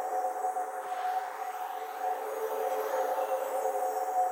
orb loop; clash; lol

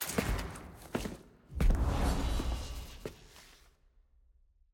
click; archive jayce

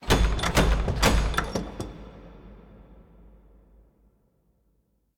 bang

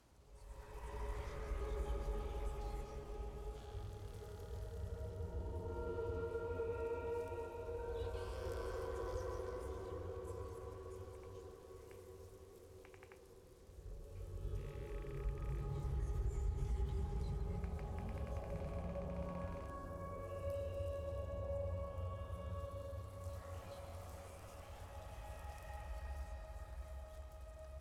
amb charred forest bloomed; lol

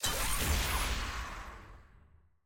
skin click; pyke

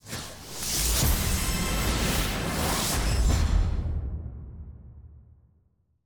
trophy celebration; clash brackets

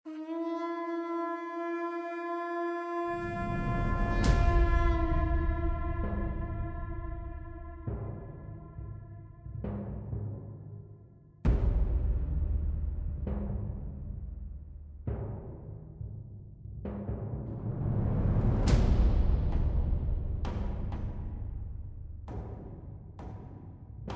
champ select background; jade

